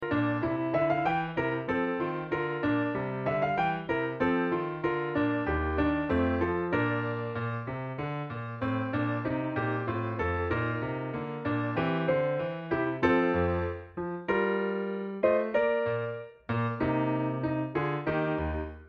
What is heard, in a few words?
Et Exultavit PIANO ONLY; Audition Selection; Et Exultavit by Antonio Vivaldi, arr. by Henry Leck; North Carolina Elementary Honors Chorus